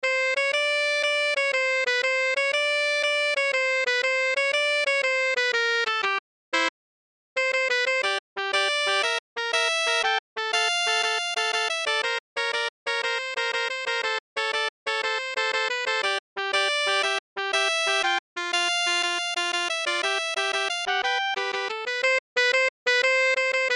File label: March - Sax Duet